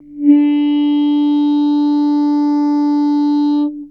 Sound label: Guitar Textures